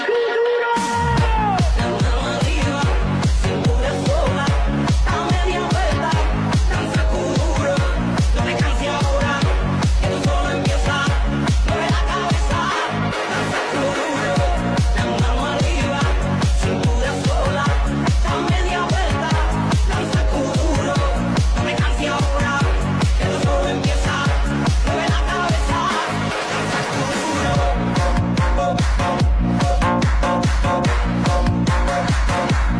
Remix

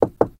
Knock

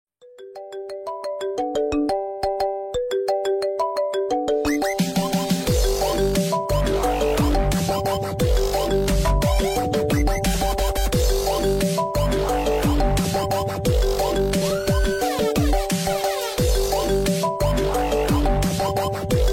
iPhone 12 Pro Max Remix

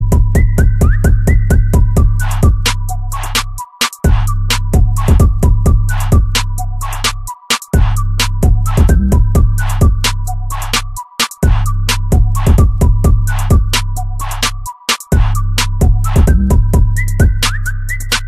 Samsung Whistle Beats

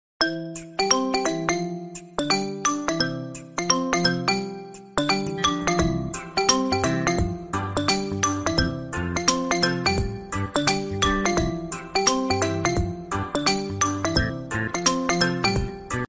Poco F3 GT Original

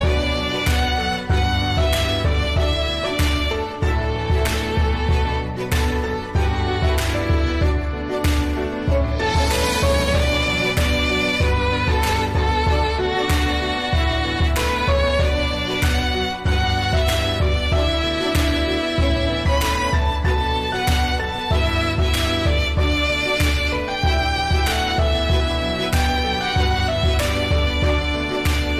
Instrumental

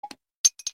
Samsung Bottle SMS

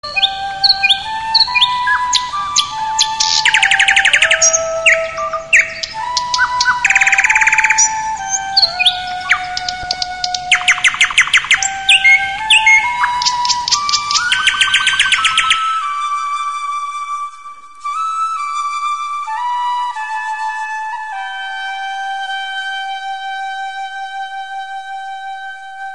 Funny Birds

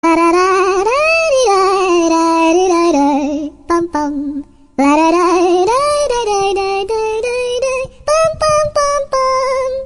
pumm pum pum

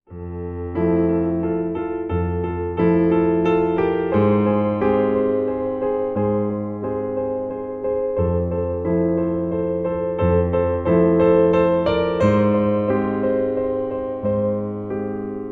Sad Music

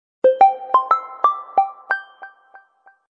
OnePlus 8 Pro Original SMS

